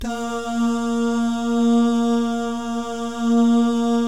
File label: DAH, Vocal Jazz; ILIO